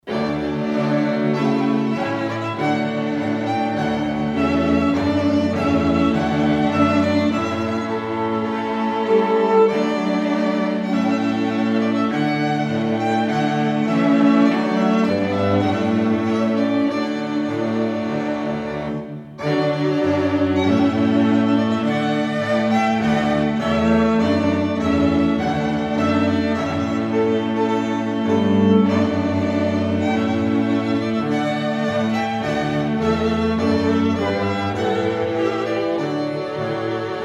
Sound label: strings